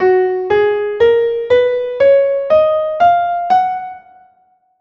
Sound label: Markan Scales F# major